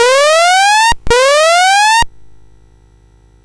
Number Quest BASIC, CSG8580R5; GAMES

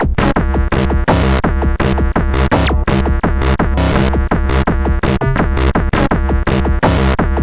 sid CSG8580R5

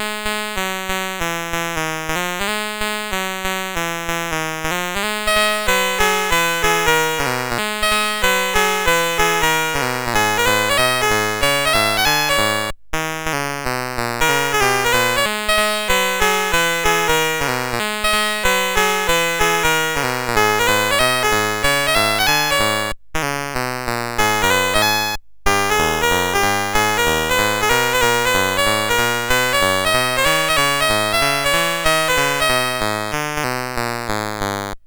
sid CSG8580R5; Metro-Cross

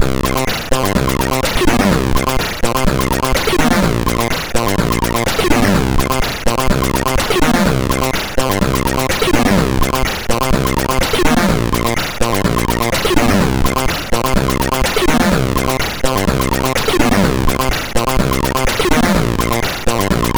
Style Loop, MOS6581R2; Hubbard

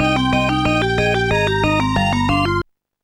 rock eleven; Instant Music

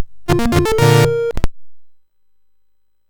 sid MOS6581R4; O Rileys Mine

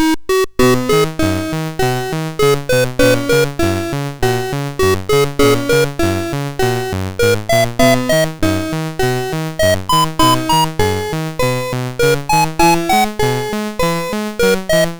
Digi Hits, MOS6581R4

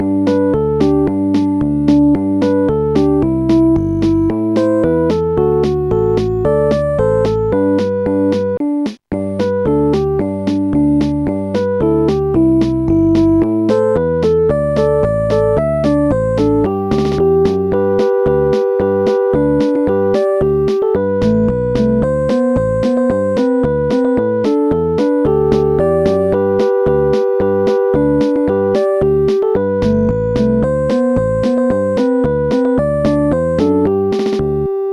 jingle bells; Instant Music

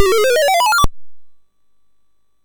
sid MOS6581R4; Frogger II-Three Deep

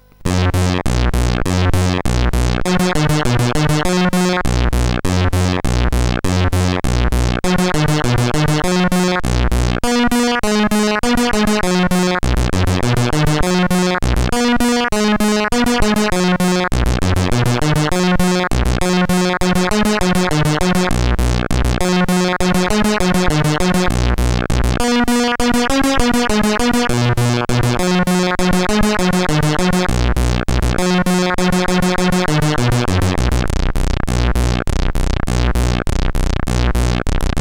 sid CSG8580R5; Rapid Fire

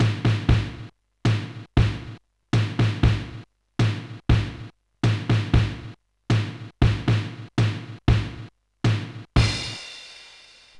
March, Sonic Drum Kit

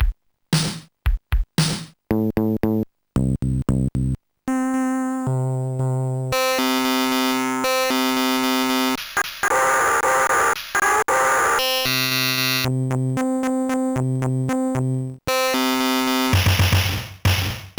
bass and snaredrum; Sonix Music Driver

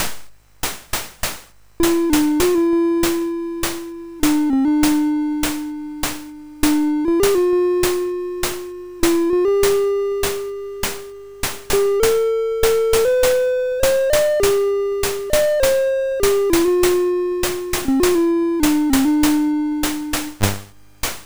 sid MOS6581R4; Benji Space Rescue

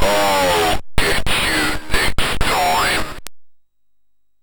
sid MOS6581R3; Out on a Limb

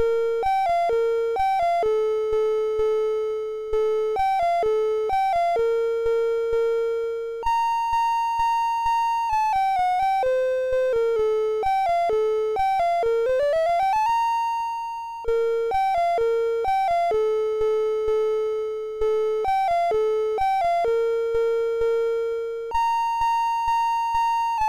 Rabbit Pie, MOS6581R3; GAMES

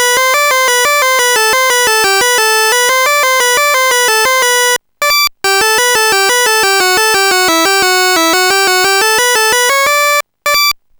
typewriter; Sonix Music Driver

